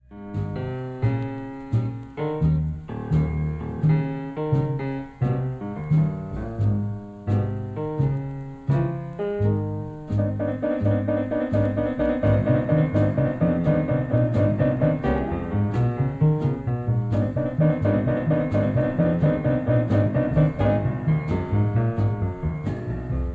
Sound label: After Hours Piano Comparison